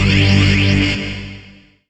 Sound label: weapon fire